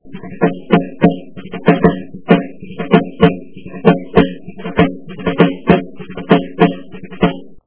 tambor; Clasificación de instrumentos

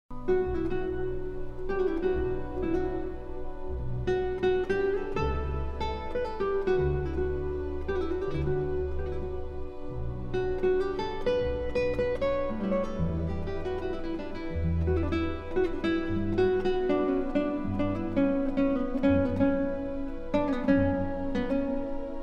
guitarra

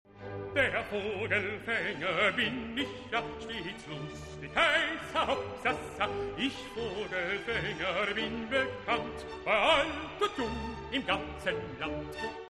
barítono

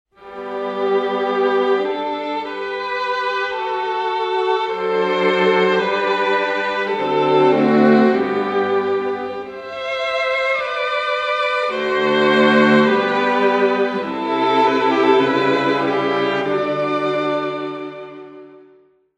cuarteto

